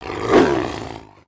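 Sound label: Sabertooth Beg; creatures; pets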